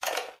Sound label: TAPE START